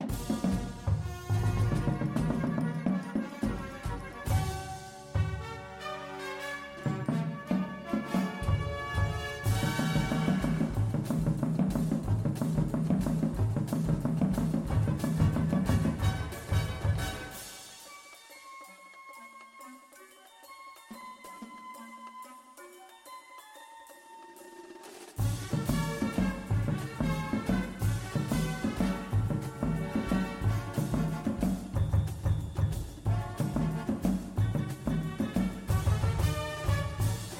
bass with music

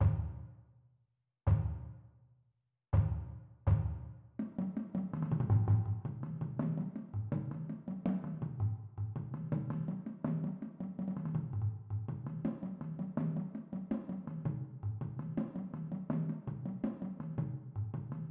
bass, drumline

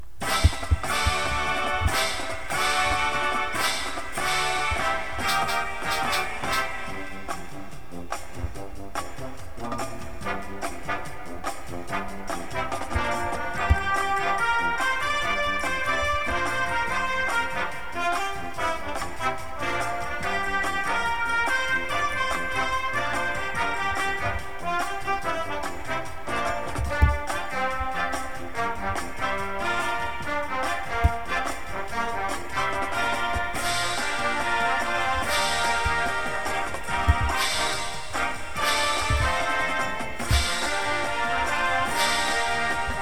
cymbals with music

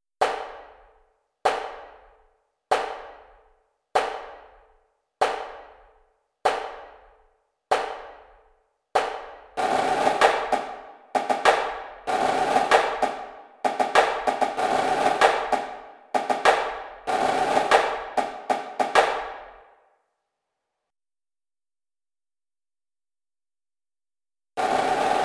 snare, drumline

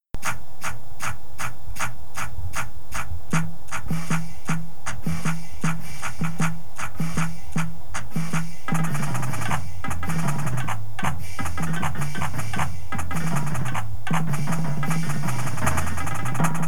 full drumline

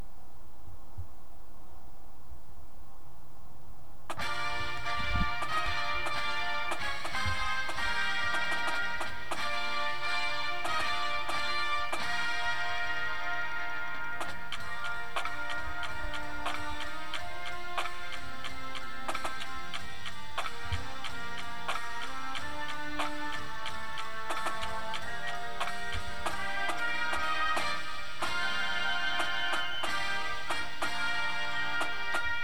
snare with music, drumline

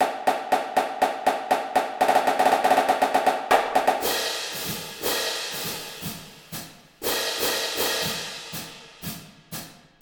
cadence cymbals

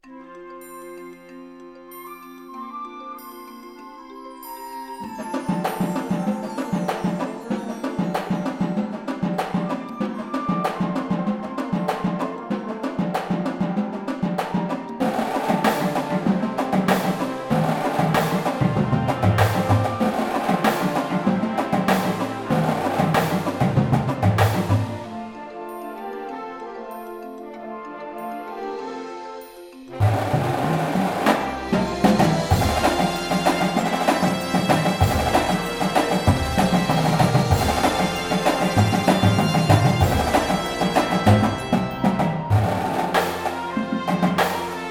full drumline with music

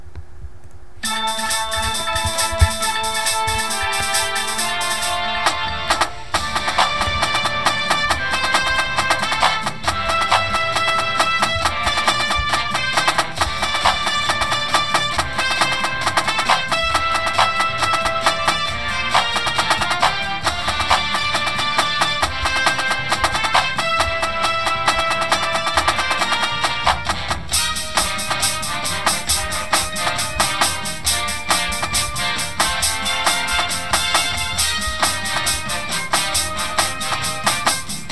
snare with music

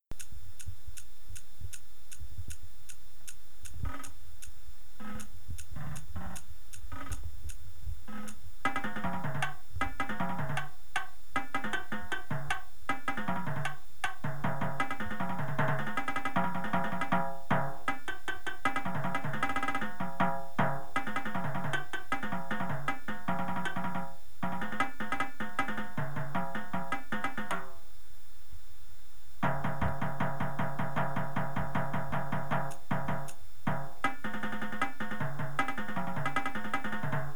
tenors, drumline

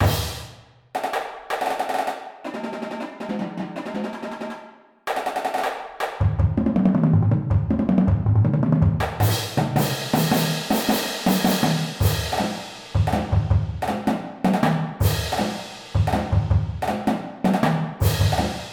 full drumline

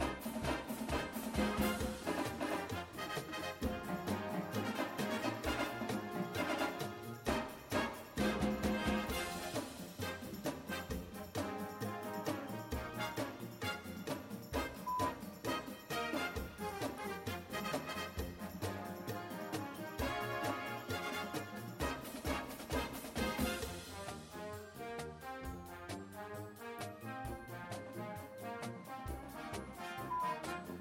tenors w music, drumline

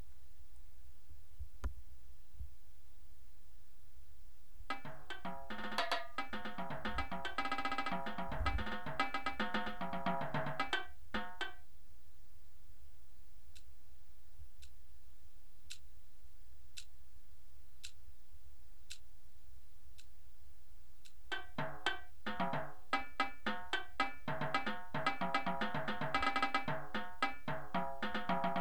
tenors, drumline

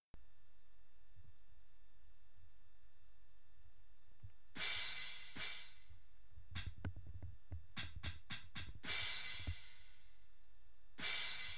cymbals

